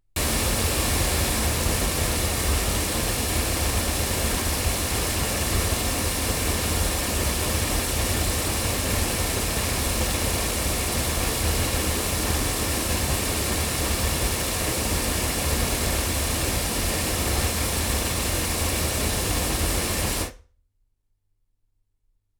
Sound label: Generis GT2 Mk2 cardioid loudspeakers